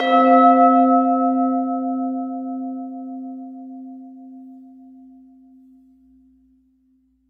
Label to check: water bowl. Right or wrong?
Right